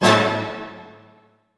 D# MAJ FF, orchestra hits; Sound On Sound - Peter Siedleczek's Orchestra

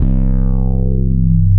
BASS, Drum & Bass 160 BPM

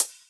Hhat, Hihat; Equipped Music; Loungin House

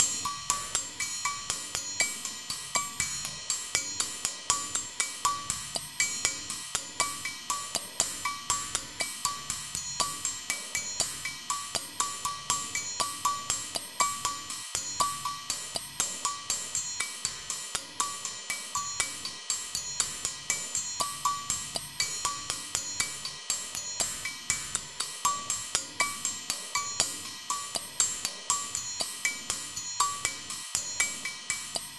Bellz More, 120, Percussion Combos; M-Audio; Pro Sessions VOL-38; Abstract World Fusion 2

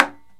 PERC KALIMBA

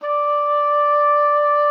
D5, OB D.AMORE; Xsample VOL-7

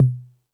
Beat Box, E-MU Pro-Cussion; drum machine

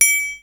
METALLIC; Q Up Arts; Dream Experience

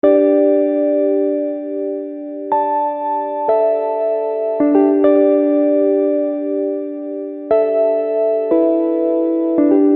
Keyboard

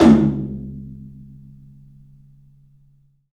3-D Percussives, LOW Toms; E-MU Producer Series Vol. 5 – 3-D Audio Collection

